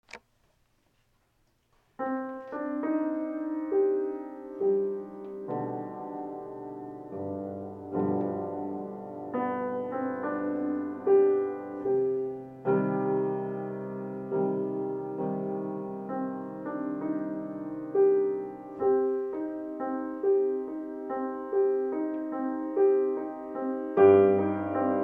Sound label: Piano